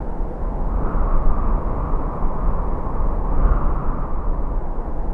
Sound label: wind